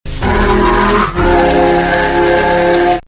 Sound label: scary; sega